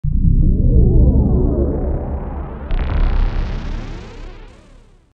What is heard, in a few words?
Alien Com